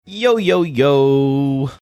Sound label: singing yo yo yo